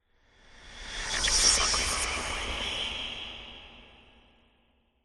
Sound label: Whispers Secrets; Safe; Silent Hill Downpour